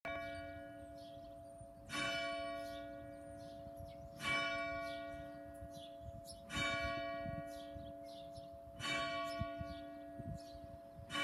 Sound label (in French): cloche; BIGNAY 17